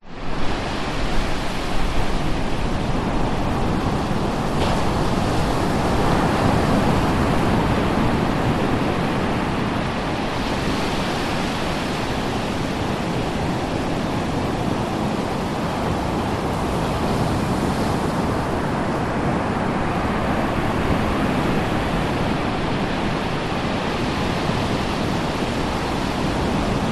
nature ocean waves break high tide sandy beach 10 metres distance; zapsplat